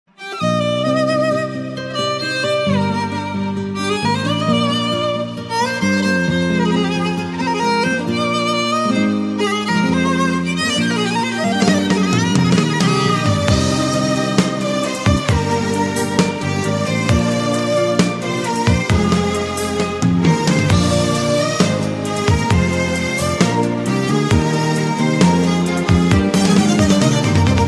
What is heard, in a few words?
slow romantic; classical